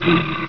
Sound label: hunt, grizzly; wanted